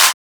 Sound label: Clap, Techno; One Shots; GhostHack; Ghosthack - Free Techno Sounds 2024